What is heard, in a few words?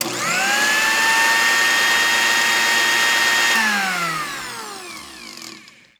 Blender on Blend; Appliances